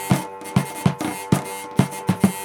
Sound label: percussion hurdy-gurdy loop